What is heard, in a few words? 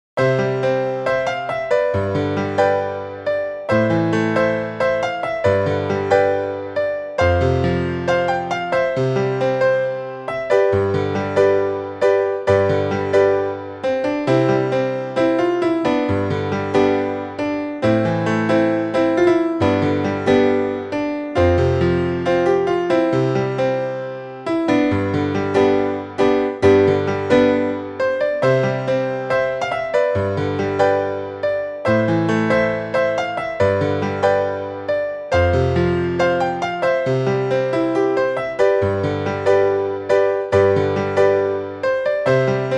Piano piece